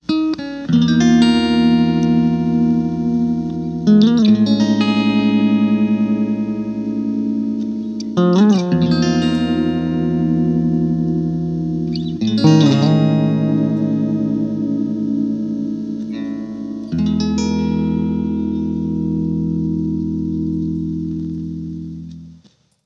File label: sick chords